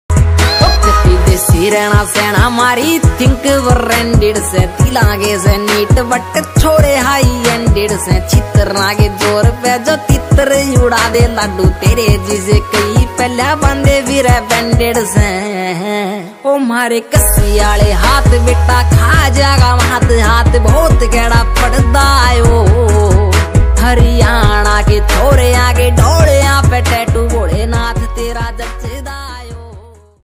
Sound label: haryanvi ringtone